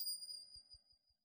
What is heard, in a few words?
bell crystal; angband